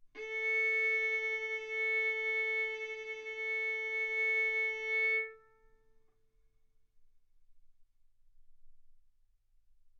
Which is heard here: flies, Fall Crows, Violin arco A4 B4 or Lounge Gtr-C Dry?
Violin arco A4 B4